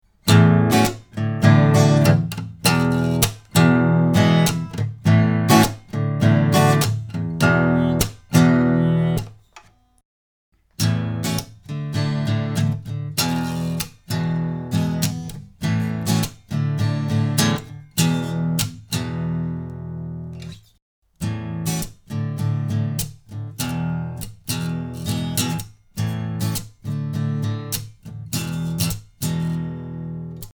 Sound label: Git 3x